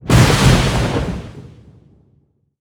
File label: Thunder